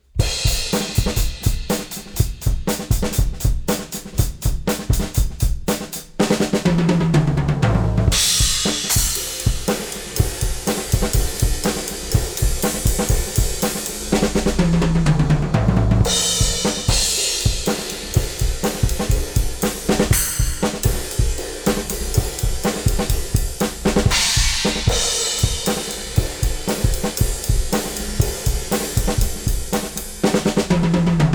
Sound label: reverb drums Drums